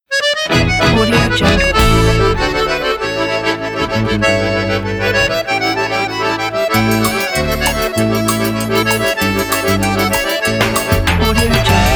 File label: accordion cultural forro